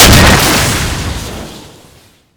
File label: rpg, weapons; Modern Warfare; rpg7